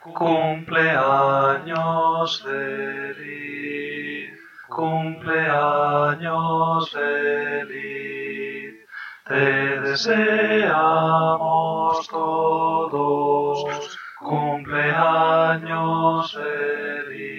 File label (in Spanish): Cumpleaños feliz cantado Alto